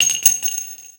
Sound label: Shell, Weapons; Warframe; Dual Vipers